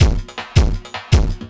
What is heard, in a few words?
LOOP L, Drum & Bass 160 BPM